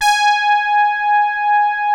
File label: POLY HARD G#5, SOFT BRASS; Music Store - Claudius Diemeter Schwerlos